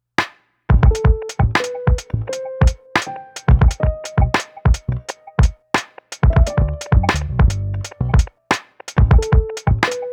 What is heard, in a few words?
lo fi piano